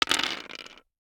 DICE on Hard Wood, Throw and Roll, Standard, 6 Six Dice; Samples; ArticulatedSounds; DICE Sfx Party (Extended)